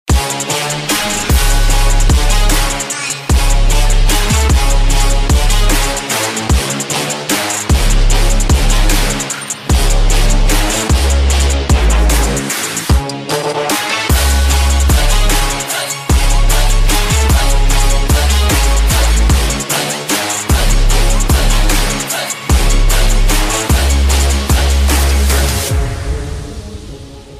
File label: Trap & bass mix sound